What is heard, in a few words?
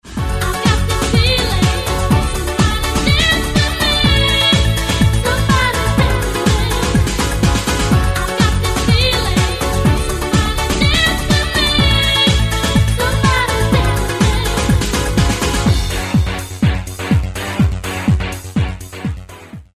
BEAT